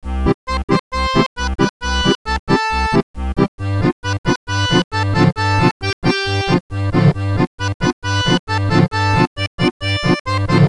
Main Music; Franzosisch Buddy; NDS

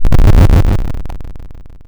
8BIT RETRO Powerup Spawn Rumble mono; Slette Mette android ios macos windows gamerip 2021

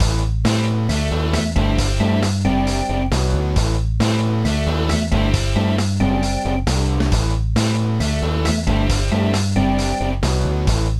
Punk Rock; Dream Salon; nds

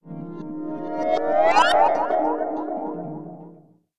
Powerup; SFX Battle; Hilzartov; Fairy Size Doesn't Matter

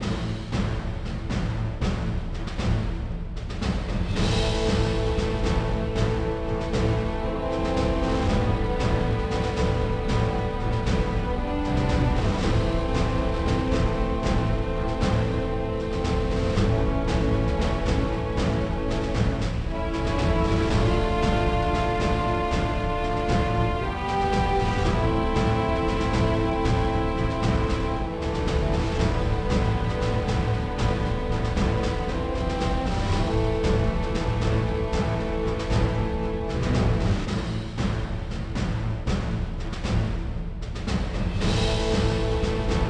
BGM UI Main Menu

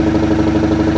Toyota Gt86 Idle

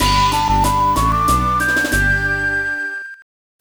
Jingle Heart mini game

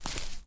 Footstep grass; this is the zodiac speaking 2020 gamerip switch ps4 xbox one pc macos

